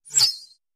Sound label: Arrow Whistle Bys, Medium; Arrow Zing By Close Up. - Archery; bow & arrow